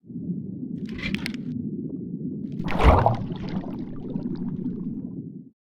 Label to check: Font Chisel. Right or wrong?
Right